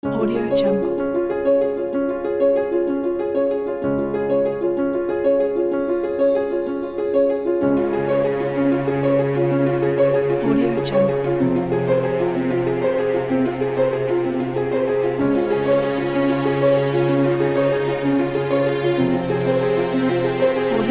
magical piano inspiration

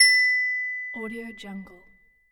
bright ding alert

